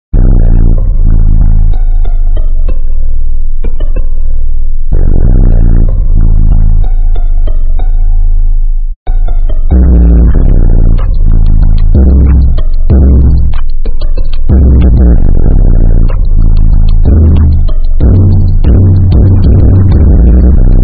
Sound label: ultra bass boosted theme; amogus